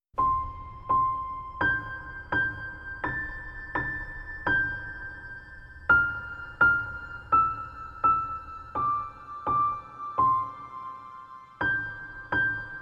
Piano, Baby Lullaby Piano Sleep Music; Twinkle Twinkle Little Star

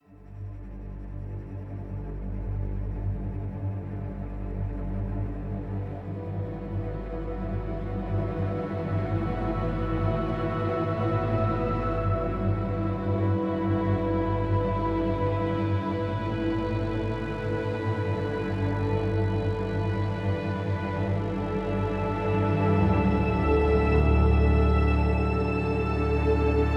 Awakening Ambient

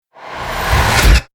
Burst Wipe Wipe; Ninja Tracks

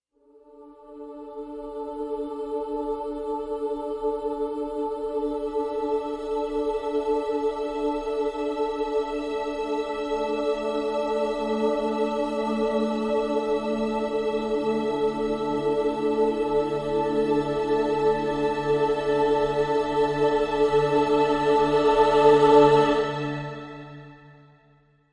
Girl Boy String; Brand X Music; Brand X Music Catalogue - Editor's Essentials

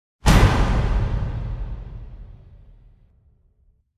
Headhunted Hit; Gothic Storm Music

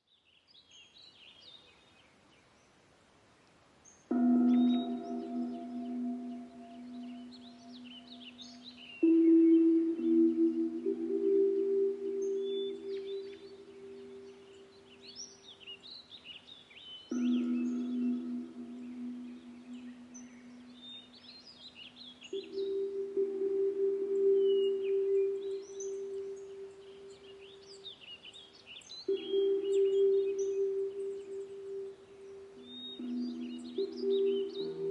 Soothing Tibetan Tones for Yoga

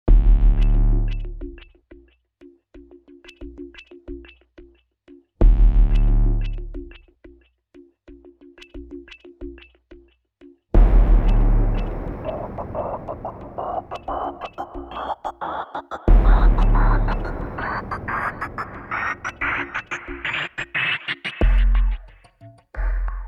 Spinning Blades (Percussion); Fired Earth Music

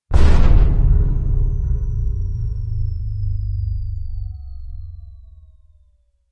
Shovel Hit Downer

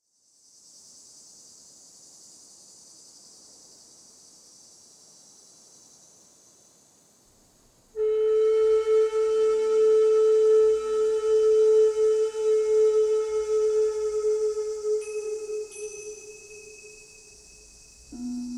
Cricket Dreams & Zen Sleep, Flute

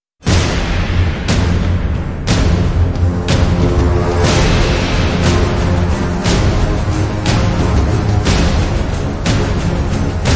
Percussion

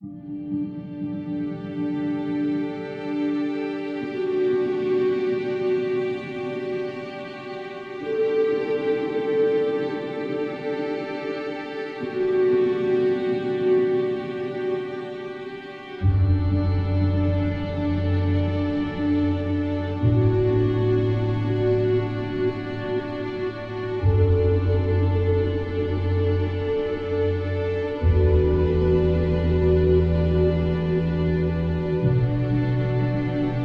Heartbreak Ambient

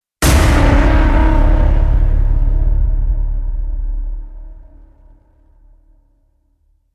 Hit Metal; Ninja Tracks

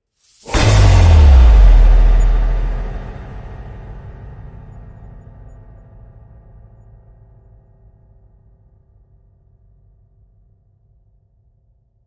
Impacts; Gothic Storm Music; Advanced Sound Design 2012

